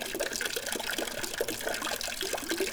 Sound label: water fountain; Golden Crayon Sounds